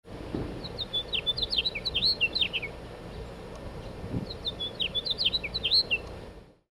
finch song

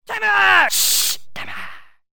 shh

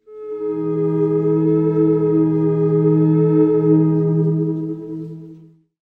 horror swell

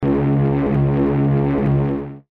ship horn

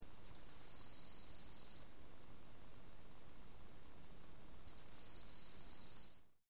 black legged meadow katydid

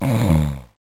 snore

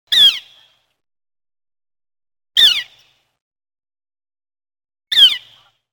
flicker

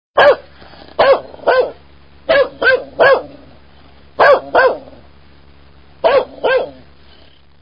Dog Barking

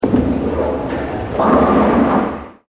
bowling

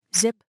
ZIP

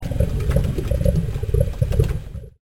bubbling

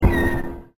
whine

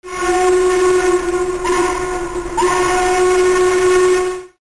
BELL

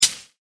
HHat Amb Crackle

